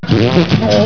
rude noise

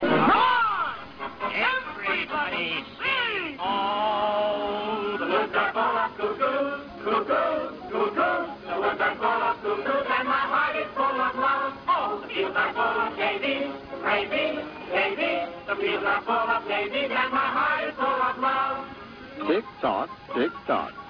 cuckoos

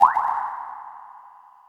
drip

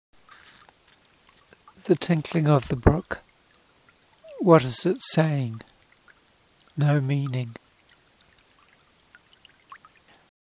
lower stream tinkle

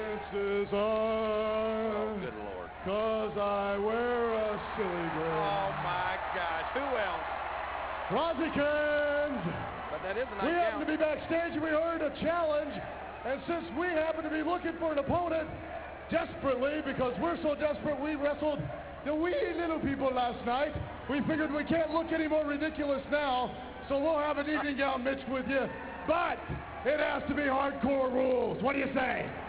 singing al

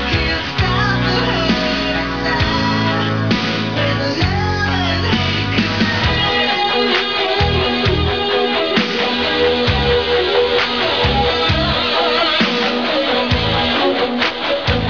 solo

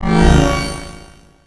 organ

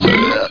burp

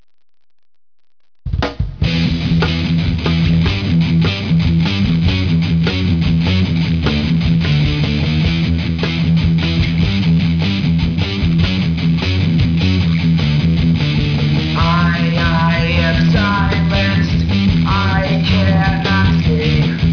Silenced

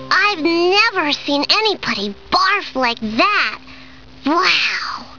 barf

